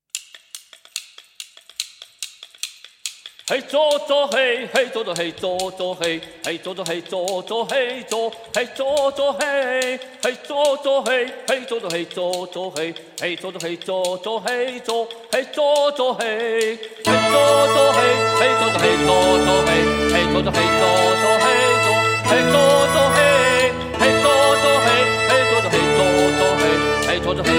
Chant

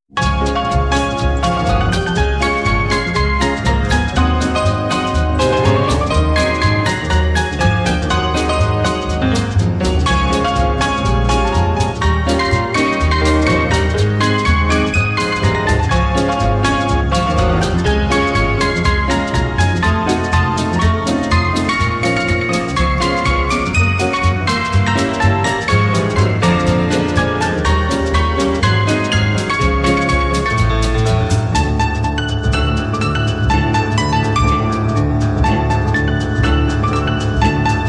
Piano Party

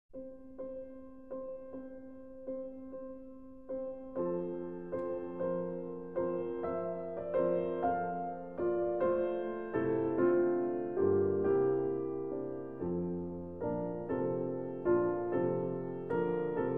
Ballade No. 2 in F Major

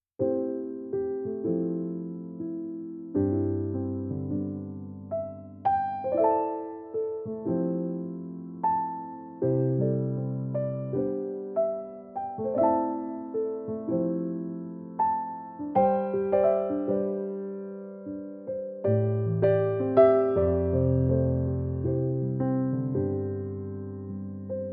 Piano Version

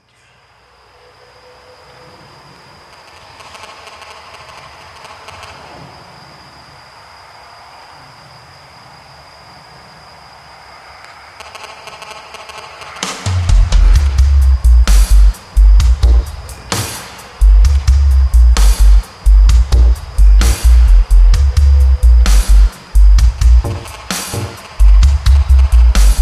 Instrumental Version

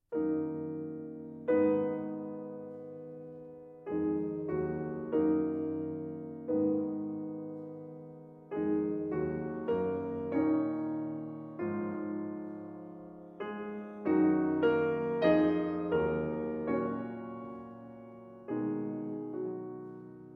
Old Pieces For Piano Melodie

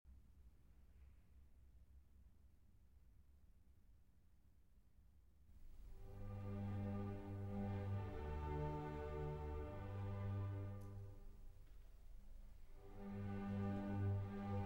Violin Concerto in D Major; Op. 61; Beethoven; II. Larghetto

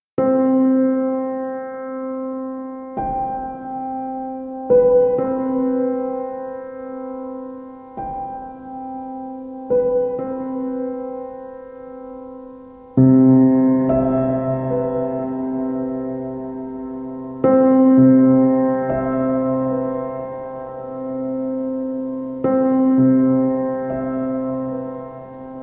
Piano Sounds

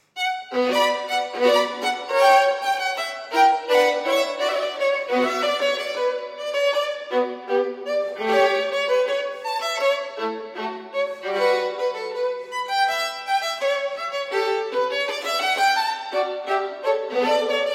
Violin Partita No. 1 in B Minor, Tempo di Bourrée; BWV 1002 VII.

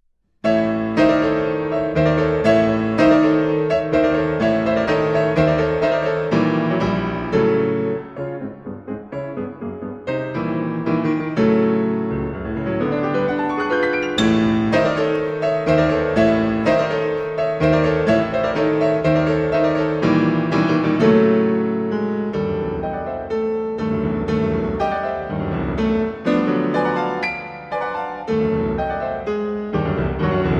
Piano Sonata in A major, Allegro moderato

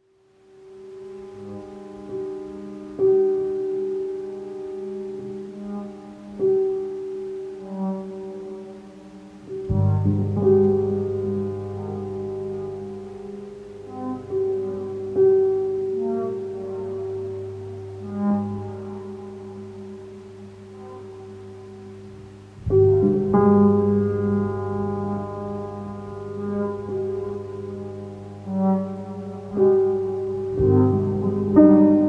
Sonata for Piano and Sequential Split 8

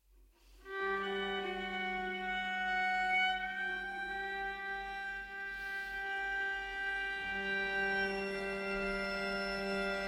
String Quartet; No. 4, Contours I. Viðoy

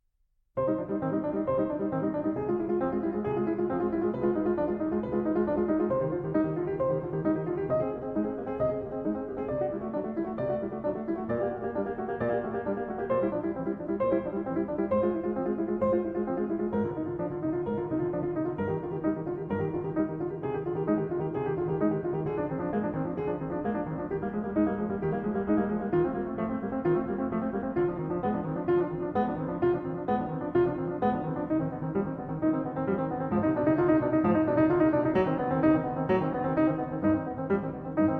Prelude in C Minor, Clavier; Prelude & Fugue in C Minor; Well-Tempered Clavier, Book I, No. 2

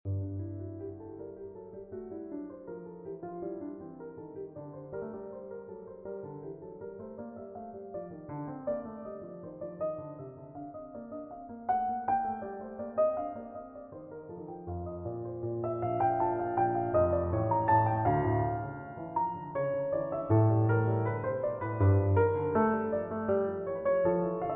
Invention in G Minor

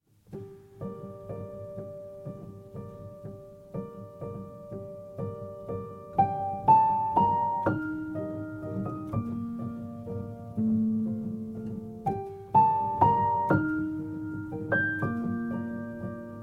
Winter Waltz (The Music Box Version)

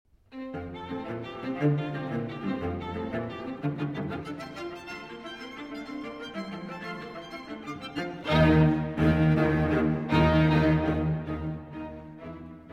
String Quartet, F Major, Vivace

